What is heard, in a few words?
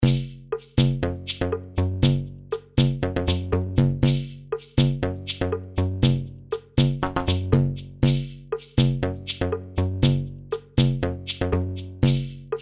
Bug Band Loop